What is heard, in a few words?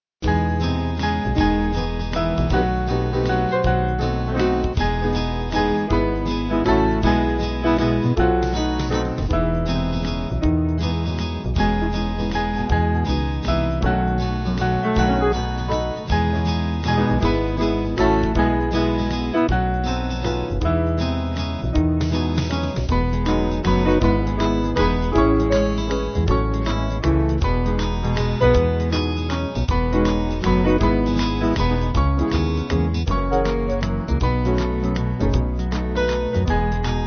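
Band Upbeat, Music Backing Files for Churches; Jesus Lover Of My Soul; Martyn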